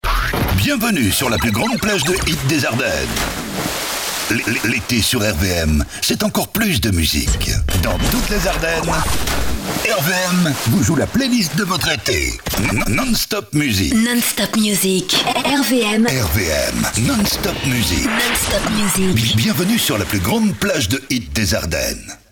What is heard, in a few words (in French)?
Jingles